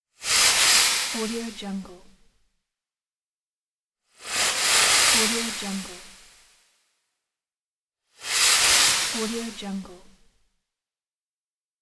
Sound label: witch transform swooshes; lokohighman